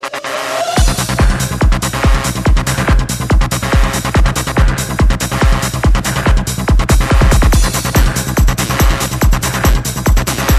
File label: Psycho Trance